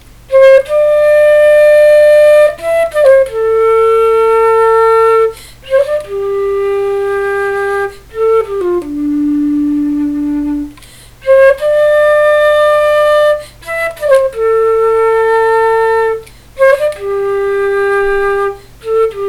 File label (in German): querflöte; naruto